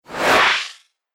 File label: Wizard Casting, magic sound effects